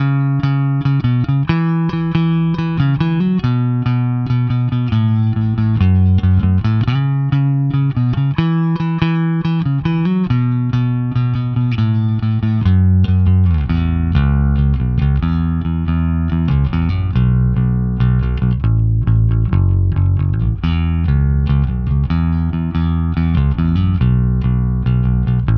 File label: Lakland DD bypass tone, bass; Bypass reference tone; Lakland Duck Dunn P bass, Lindy Fralin pickup, DR Fatbeam strings, pick; GT-10B USB